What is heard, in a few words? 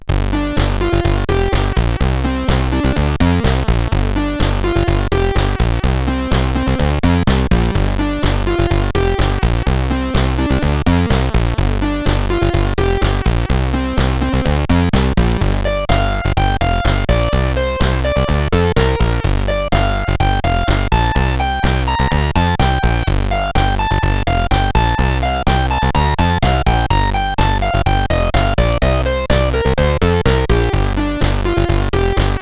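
Lights On, MOS CSG8580R5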